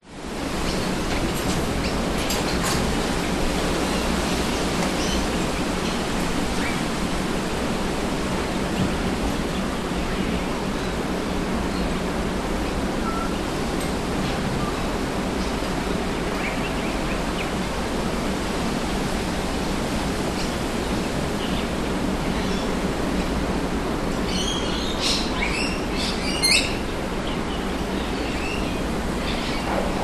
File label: ambience suburban wind trees birds neighbour in garden car internal by open window